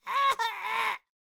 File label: Barrel Roller Death Vocals